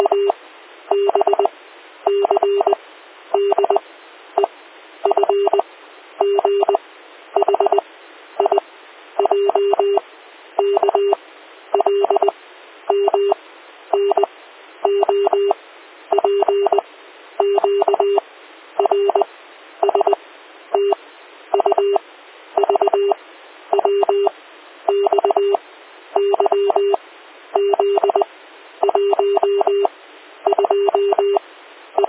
Morse Code Alphabet

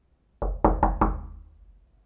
Knocking At The Door

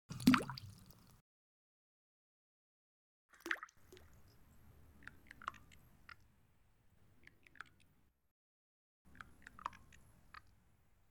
Droplet

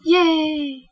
Yay

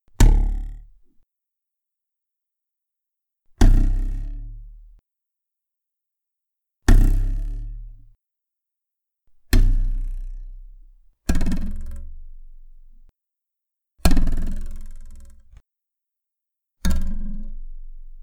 Cartoon Arrow Hit

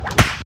Foley Punch

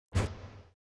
Swing Bat

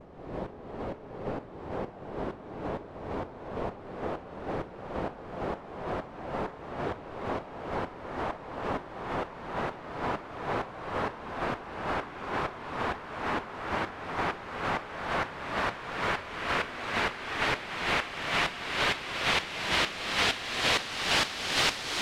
House Trance Sweep Effect

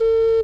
Ocarina Note

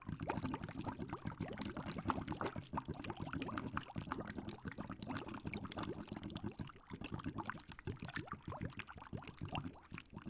Bubbles Zoom

